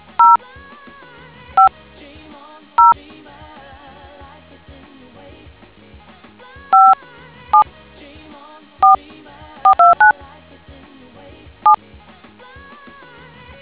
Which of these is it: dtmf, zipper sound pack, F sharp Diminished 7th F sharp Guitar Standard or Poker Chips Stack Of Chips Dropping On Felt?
dtmf